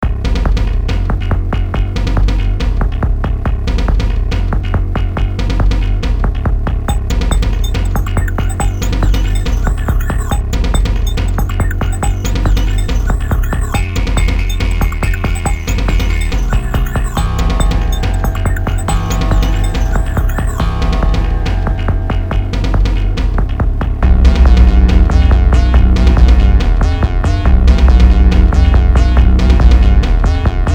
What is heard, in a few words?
Electronic